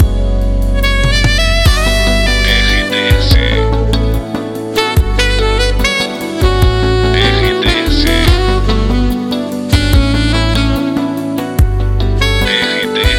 TRILHA PARA LOCUCAO RELAX; Itapoan FM 2020

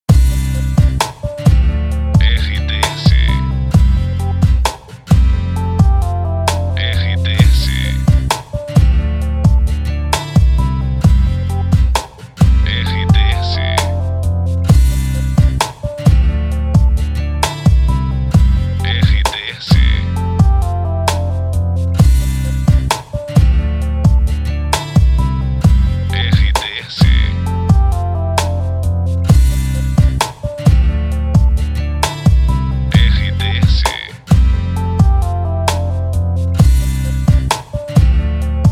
trilha para locução adulto; Web Net; Web Rádios

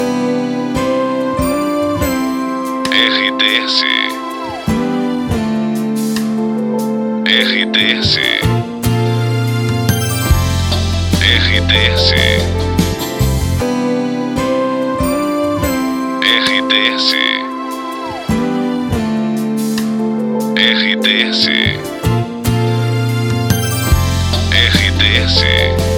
trilha para locução; Oeste

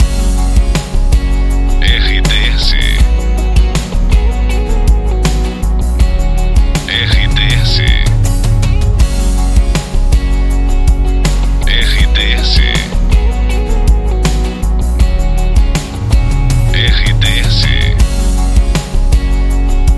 trilha para locução; Pacote Itapoan FM, Salvador/BA, uma emissora com característica jovem

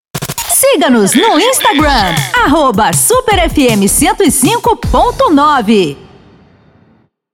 intercom

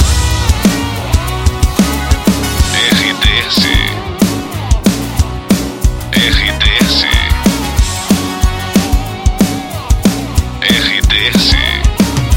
trilha para locução; Pacote Praia FM, Bertioga/São Paulo. Estação com características popular

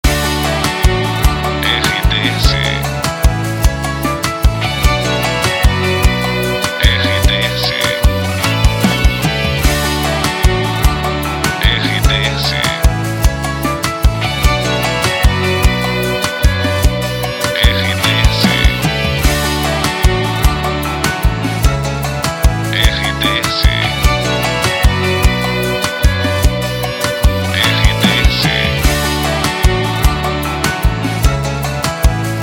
TRILHA PARA LOCUCAO ALCORADA SERTANEJA

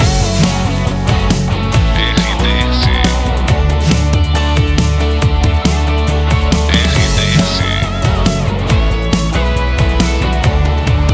trilha para locução; Pacote Cidade FM, Pato Branco/PR Emissora com perfil Jovem

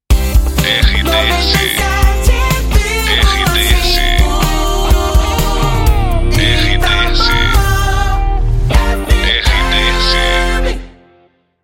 PASSAGEM; Itapoan

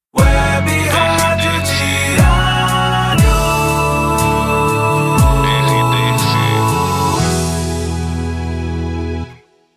PONTE WEB RADIO; Web Radio Diario 2021

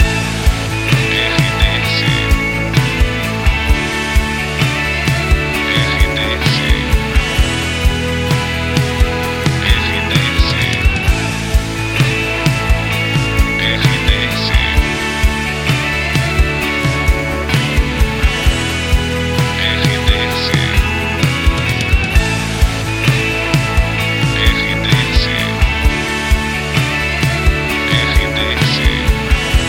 trilha para locução; Rádio RBC

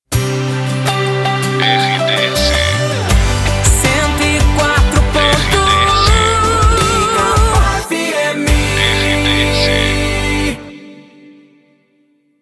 PASSAGEM RADIO; Igapo FM

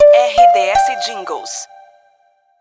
sinal de hora; Pacote Praia FM, Bertioga/São Paulo; Estação com características popular

